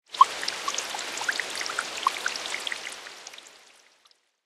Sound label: rain on water; factorio